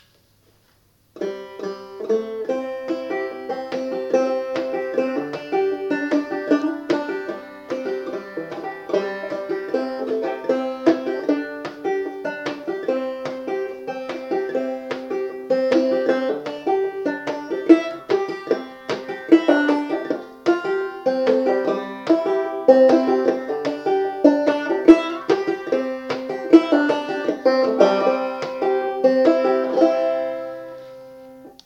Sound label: down to the river banjo, Banjo: Open G; Capo 0; Down to the river to pray